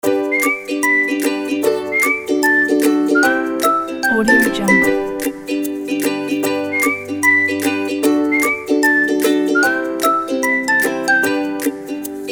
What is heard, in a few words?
children ukulele